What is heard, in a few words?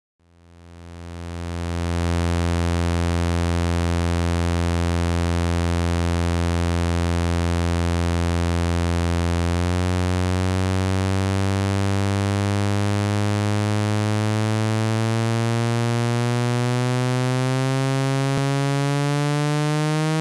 VCO SAW